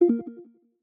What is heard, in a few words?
rec stop; VoiceInk